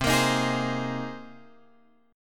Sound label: C Minor 7th Sharp 5th C Guitar Drop D